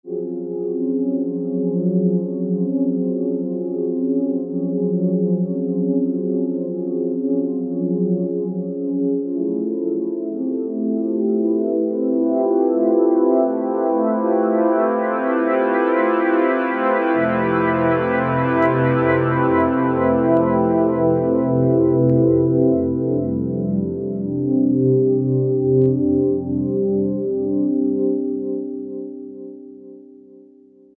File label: IDM Pad, Korg microKORG; Factory Voices